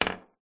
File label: foley ballbearing set down wood surface; zapsplat